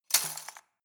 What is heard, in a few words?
cutlery plastic multiple drawer place hard